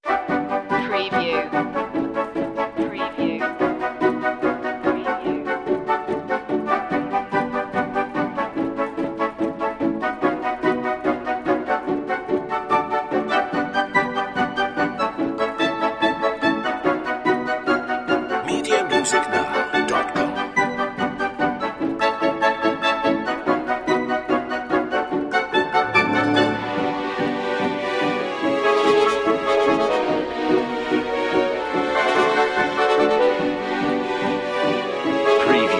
no bass perc

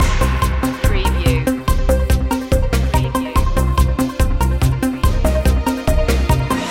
newsbreak loop

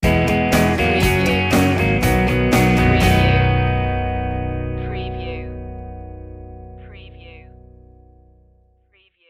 sting

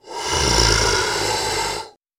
Monster Animal Groan short

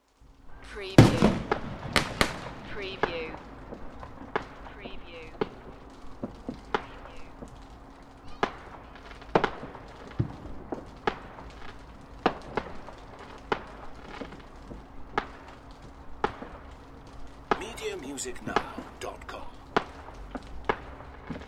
Fireworks fire crackle; beanstalk audio

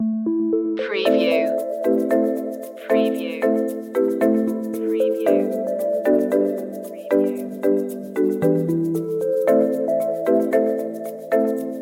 diminishing returns shaker

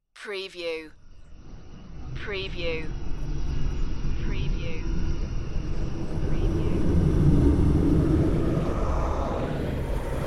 smooth dark flybys

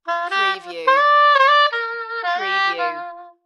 Sax Smooth Riff

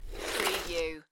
Snow and Ice, Ice skate slide; Weather sound effects

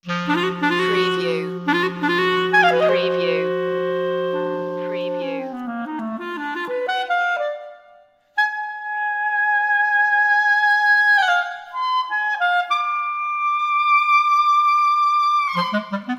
clarinet conclave

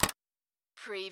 expand

